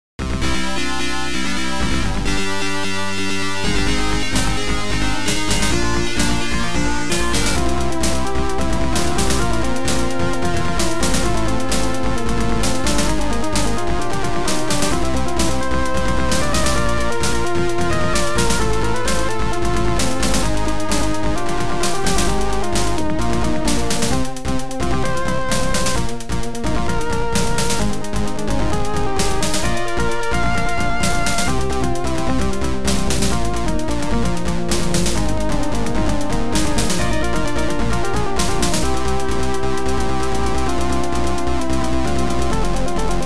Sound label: OPL2; Tyrian